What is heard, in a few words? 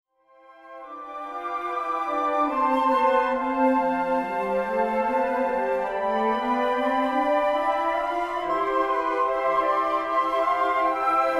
Amazing Grace, Flute Choir; CHAMBER WORKS